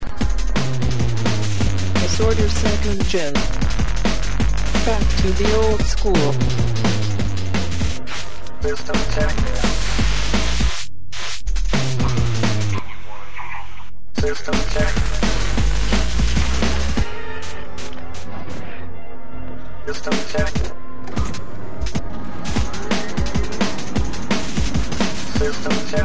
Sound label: jungle drumnbass raggajungle breakcore